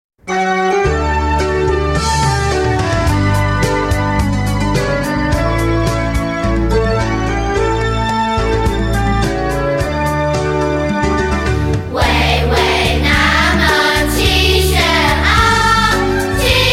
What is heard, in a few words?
song